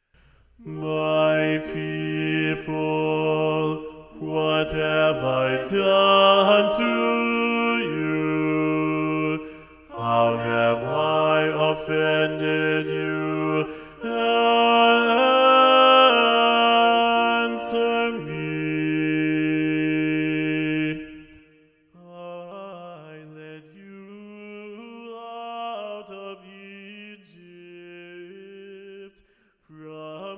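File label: The Reproaches Part 1 SATB Bass Predominant, Rehearsal Tracks; Tomas Luis de Victoria; ed. Geoffrey Cox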